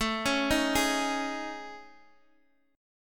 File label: A Major 7th Flat 5th, Guitar, Open D